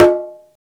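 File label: perc conga, deep congas; Roland LCDP14 Africa VOL-2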